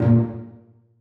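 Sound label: celli pizzicato a2; Sonatina Symphonic Orchestra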